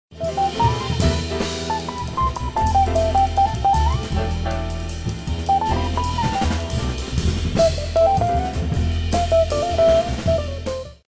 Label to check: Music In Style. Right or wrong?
Right